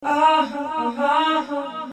Vocal Echoes

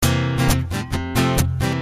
Strumming C7,F,C 133

